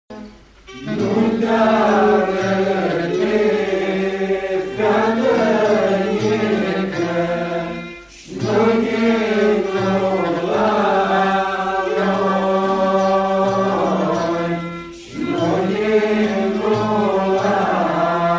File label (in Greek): Χορωδία παραδοσιακή; Βέρμιο; vlachofonoi ellines